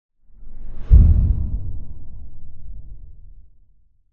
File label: impact underwater or space soft shot; lukas tvrdon